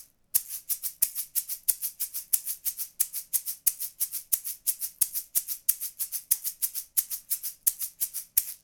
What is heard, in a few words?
shaker; neumann tlm103